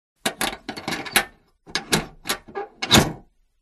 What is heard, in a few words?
door metal small squeak open close; opening things; silverplatter audio